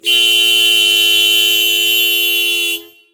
horn, car

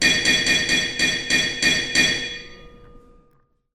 ending gong

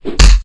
middle kick; attack

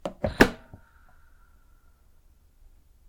close rice cooker; cooking

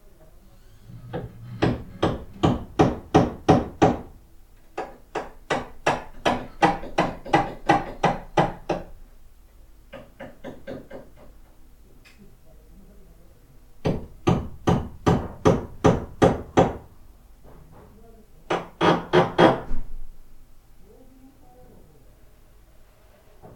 remodeling

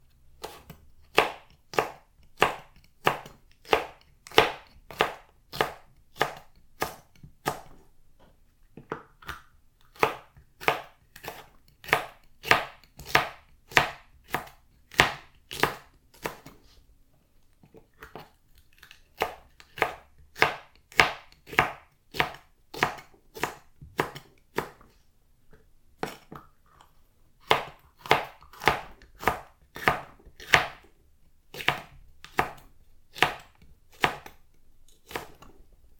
cut green pepper; cooking

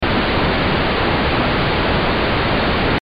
tv noise

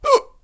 man hiccup

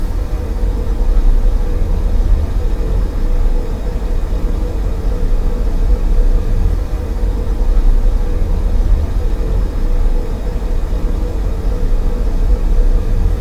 factory

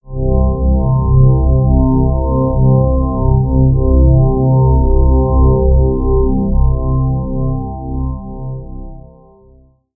silent end; anime

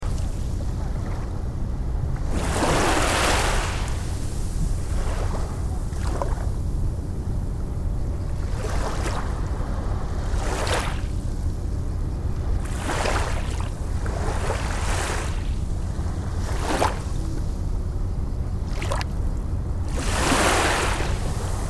port

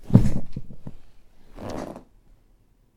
sit on leather chair; レザーの椅子に座る２

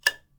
bathroom

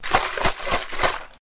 shake PET

